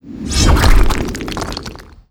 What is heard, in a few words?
Skill Power stump; FlyForInsanity